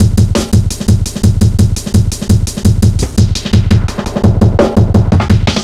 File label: 170, Drum Loops, Drum & Bass